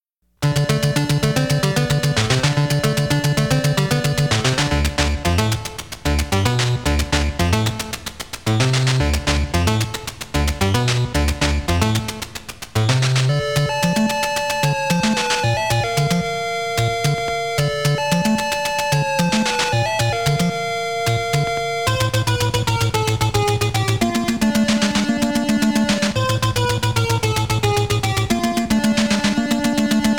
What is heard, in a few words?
BGM